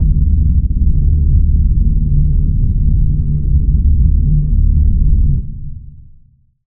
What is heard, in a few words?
Earthquake; Routed Sound; Serum 2 Expansion - Quantum Enforcer Vol.1